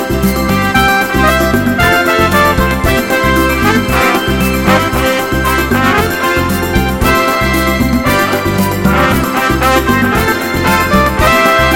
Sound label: Fast Unusual Sounds